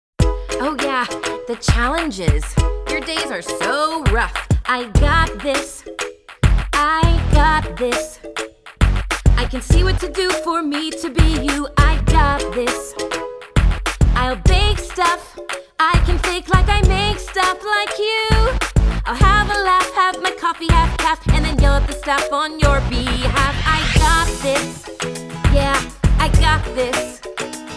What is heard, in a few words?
Vocals